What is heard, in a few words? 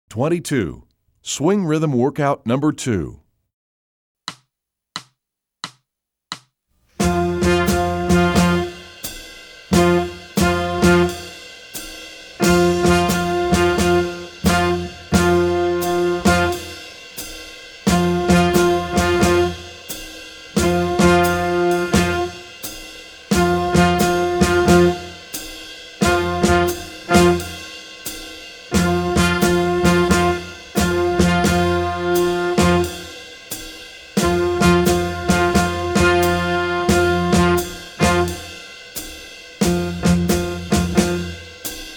swing rhyhms workout